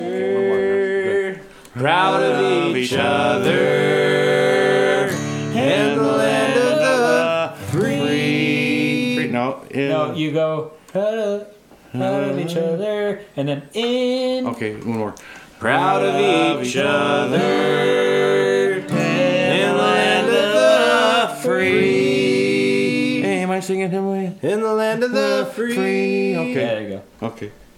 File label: Harmony Parts